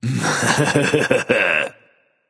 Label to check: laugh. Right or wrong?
Right